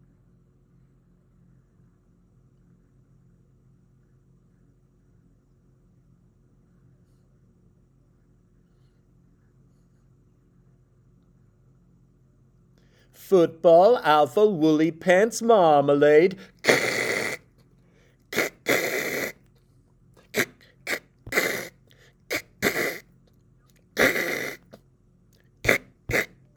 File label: Telephone Vocal